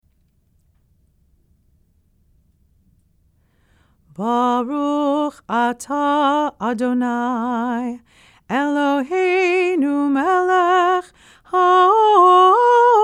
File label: YOM KIPPUR W CANDLES SUNG